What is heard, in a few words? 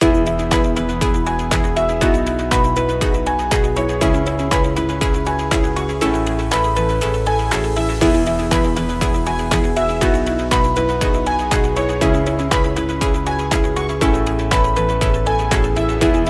Positive Calm; MIUI12